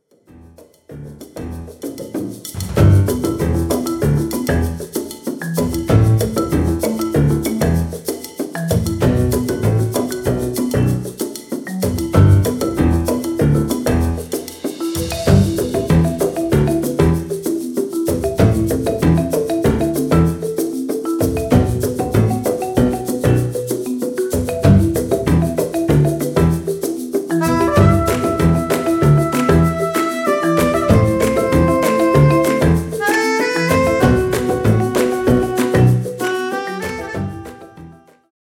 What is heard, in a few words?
Boys knocking on a Piano